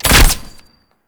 Bow Shot

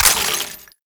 Ammo Pickup Radiation; Ammo Ability; Warframe; Warframes; Frumentarius

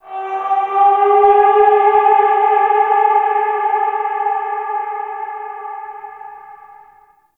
Landscape Ambient Texture

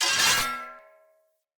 Melee Whoosh Accent; Weapons; Swords; Dendrit Short Sword; Vetch

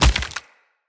Wood Flesh Impact; Weapon Impacts

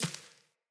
Sparrow Step Default, Footsteps; Animals

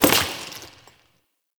Follie Enemy Step; Warframe

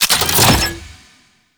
Mech Omni Tool Equip; Warframe; Nechro Mech; Abilities

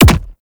Explosion Punch Generic Extra Large; Explosion Punch Layers; Weapons

